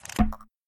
autoplay off; buttons; Xing Yun Jin Chan

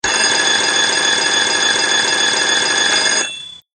RING LONG; Hat Trick

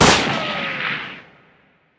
tornado; Wild Guns

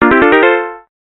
WIN; Multi Win; multi card win

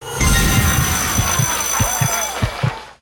big win; Dragons Gift; slot

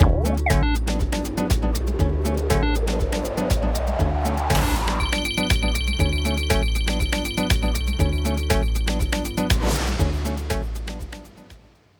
bigwin; Lost Treasure; Wazdan

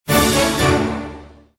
WIN; Golden Reel

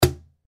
reelspin click; Egyptian Mythology

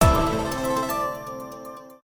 win; Fruit Express

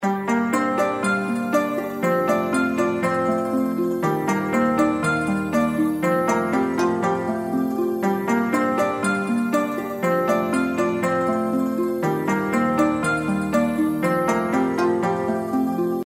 intro bgm; Four Beauties

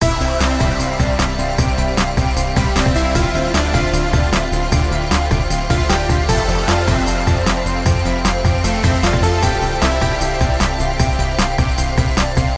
count up; Lucky Joker 20

